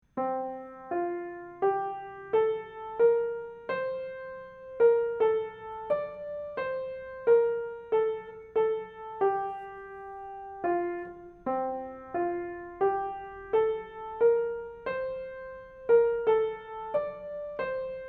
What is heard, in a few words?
Hymn Melody; What God Ordains is Always Good